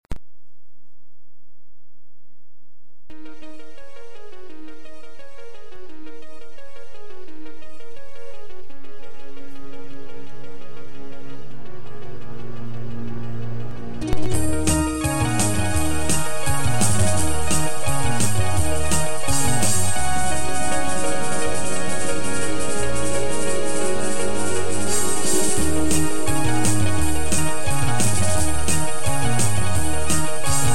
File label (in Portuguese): rock chines instrumental